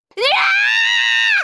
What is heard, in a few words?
cat screams